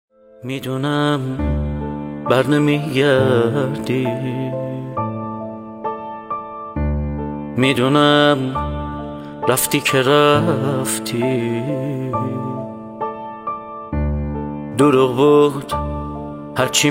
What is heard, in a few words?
Piano